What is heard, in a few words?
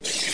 close Panel